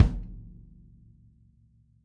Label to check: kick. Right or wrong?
Right